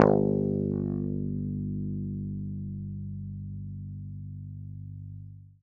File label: g2 fff, swagbass